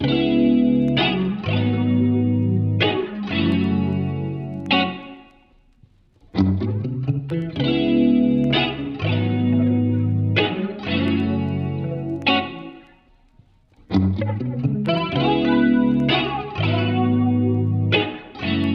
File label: Journey Guitar Loops, 127 BPM C# Min; Evening Lovers; Cymatics